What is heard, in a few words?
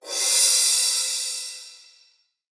Crash; Cymbals